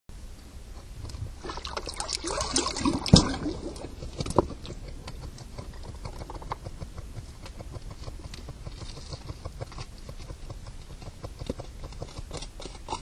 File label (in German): ab und auftauchen, biber